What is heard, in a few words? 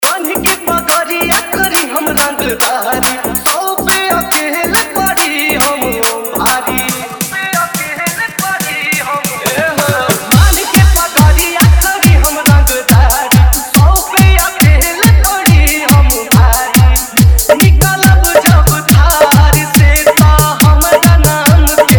New Bhojpuri Hard Bass Dhollki Mix Dj